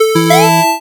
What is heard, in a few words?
powerup, nes sound generator